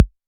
Kick 808 Tone11; gate experiments

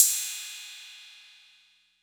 Cymbal 808; gate experiments

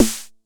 Snare 909 Tune1; gate experiments

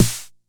Snare 909 Tune1; gate experiments

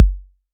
Kick 808 Tone11; gate experiments

